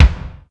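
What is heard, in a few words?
GATE kick; Crypton; DrumBase5000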